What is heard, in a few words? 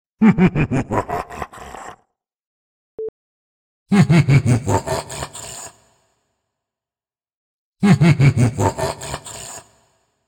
Evil Laughs Demonic Echos